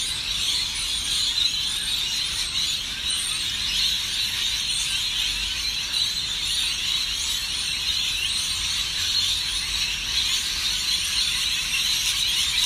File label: Lorikeets